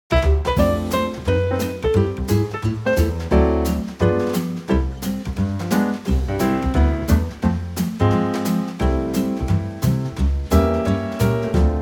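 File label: backing track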